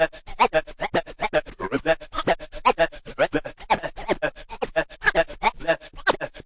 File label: Scratching and Turntablism